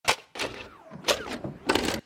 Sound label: VHS tape stop